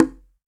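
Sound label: conga high closed; STEREO CONGA; Cuba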